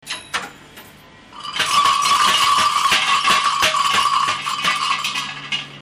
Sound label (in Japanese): garagara